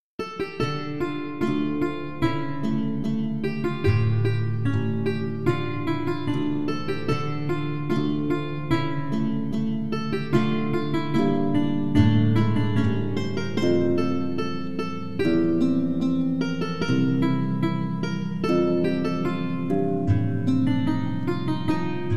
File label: bourree Dm